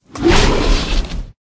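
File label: Dragon Skeleton buff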